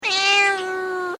Animal Cat Tabby Meow

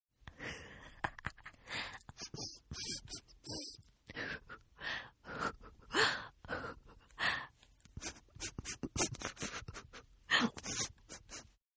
Giggle Female, Laughter; Voice; Giggle Female 3

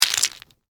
Debris Mixed Nuts, Pile; Texture; Debris Sound Effects; Materials; Blast Wave

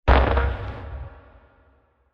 Long Impact With Screeches; Miscellaneous Impact; Impacts; Sound Effects:Impacts; CraftedSignals

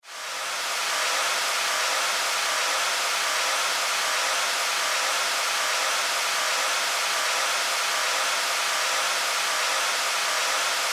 Science Fiction Static; Communications

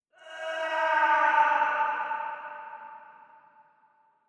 Falling Screams Of Doom; scary soundscape; horror

